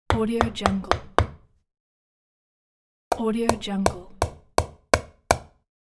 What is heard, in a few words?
hammer